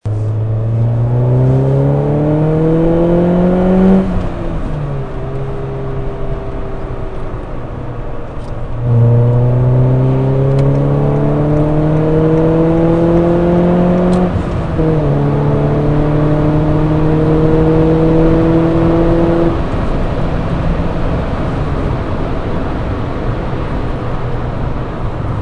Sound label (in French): micro a l avant